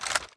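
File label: Equip Movement; Valorant; guardian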